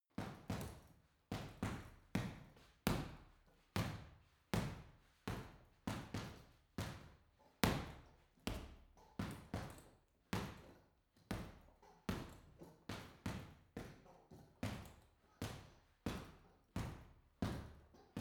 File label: boxing punching bag boxing sequence reverberant; sport-freizeit; boxen